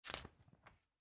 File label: turn page